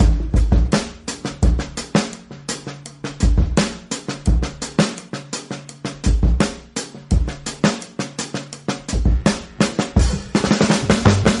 stutter a lot, drum loops, funky

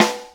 china white, snares real

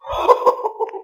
laugh 8 bit goofy guy; ooh ahh

